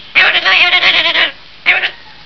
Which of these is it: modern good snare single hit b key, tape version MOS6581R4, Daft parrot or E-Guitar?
Daft parrot